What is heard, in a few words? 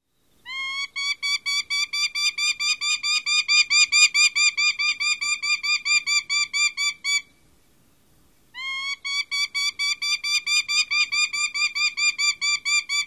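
prairie falcon kree-kree-kree-kree alarm calls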